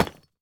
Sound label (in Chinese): 深板岩砖 放置声